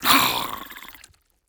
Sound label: Carapace Death